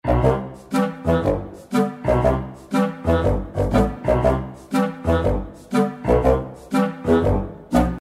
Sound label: bgm